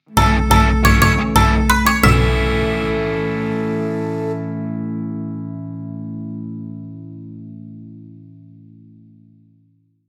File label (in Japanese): 和ジングル